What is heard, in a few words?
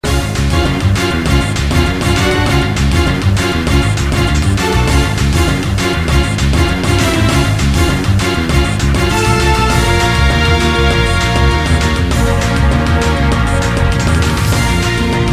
battle